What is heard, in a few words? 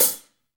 HH, Kits, Rev